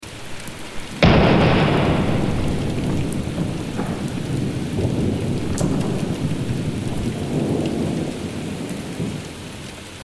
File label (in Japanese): 雷雨 ループ